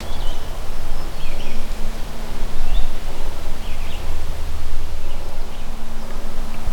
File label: Birds; aronwk collection; ndaudio; ambience pet rock